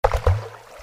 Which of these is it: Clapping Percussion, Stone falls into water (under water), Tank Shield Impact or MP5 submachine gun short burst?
Stone falls into water (under water)